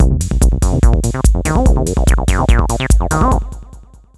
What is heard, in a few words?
Trancy base; Fanfare; 1997 MMX LAN PACK (win 95); Worms Armageddon